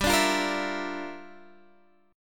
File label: G Minor 13th G down Guitar Half Step Down